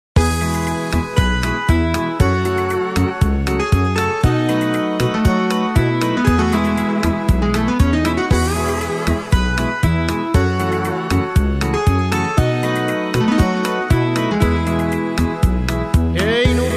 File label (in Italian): chitarra triste